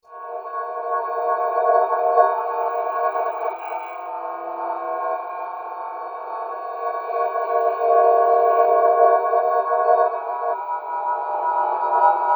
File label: Drawn Drone; Sonic Foundry (Sony Creative Software); Loop Noir